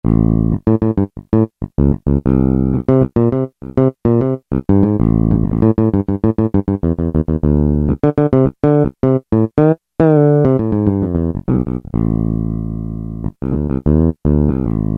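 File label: Finger Bass, Roland U-110; Factory Patch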